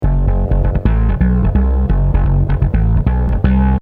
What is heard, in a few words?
bass 3 mono back